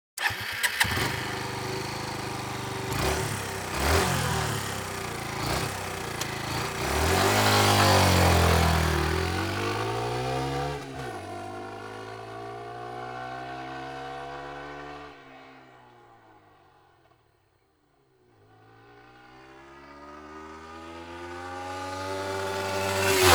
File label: Kawasaki EN 125 Eliminator